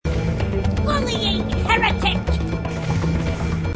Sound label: grunt; h3dialogue; grunts